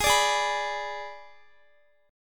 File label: Ab Diminished Ab Guitar; strummed; x,x,20,19,20,19